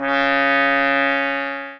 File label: sax